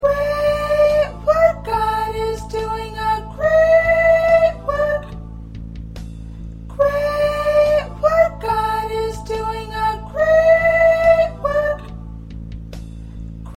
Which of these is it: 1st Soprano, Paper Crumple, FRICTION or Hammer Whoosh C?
1st Soprano